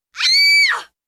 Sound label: woman scream